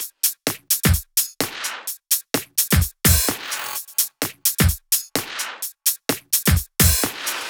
128BPM Kit; Vengeance Samples; Morpheus Kit

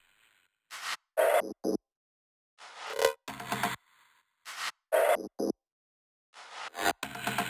128BPM Kit; Vengeance Samples; VFH1 128BPM Diesel Kit

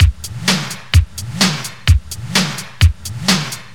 House Beat, 128 BPM

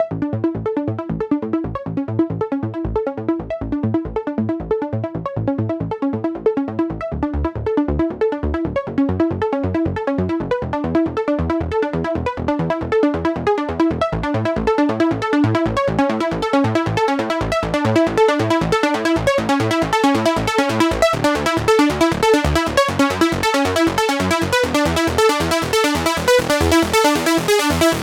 137BPM Main pluck DRY, Amajor; Unlooped

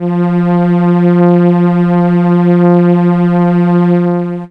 Cello F3, ARP Solina; musicradar-synth-samples

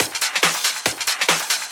Loops BB 140, BreakBeats; Vengeance Samples

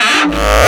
FX Scratching; Vengeance Samples

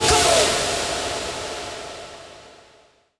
FX Impact; Vengeance Samples

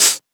Open Hihat; Vengeance Samples; VEE Cymbals

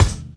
Kick, Trap

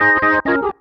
organ D#, vocal house; PS-28 Vocal House Samples; organs

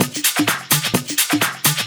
Electro Loop 128 BPM; Vengeance Samples

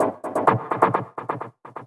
VFX2 Loop 128 BPM

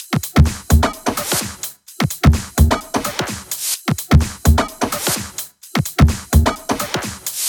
128BPM Fragile Kit; Vengeance Samples

